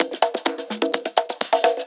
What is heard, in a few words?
Latin Loop 128 BPM